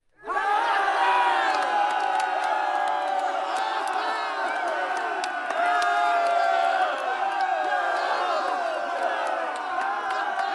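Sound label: exterior group battle celebration; sonniss gdc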